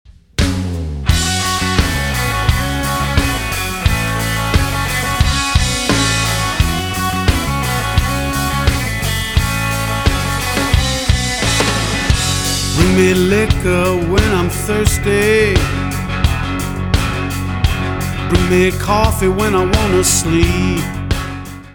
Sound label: music